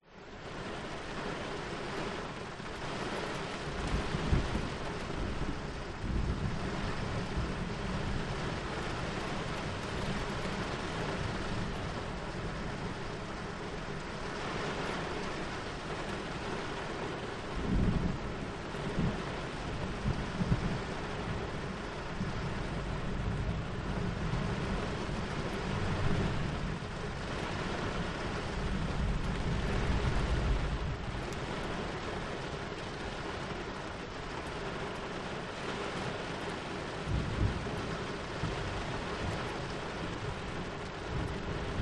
rain storm thunder distant in car perspective; zapsplat

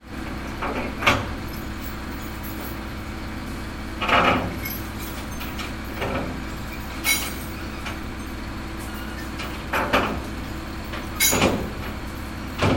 transport truck skip remove chains lift hoist

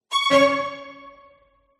MUSICAL ORCHESTRA Jingle Violin Hectical Short; smartsound

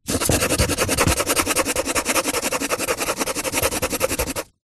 office pencil scribble fast paper

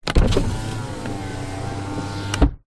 transport car suv electric window down; holden captiva

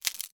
impact wood branch snap on knee

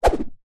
cane bambo stick swing whoosh air wobble; foley

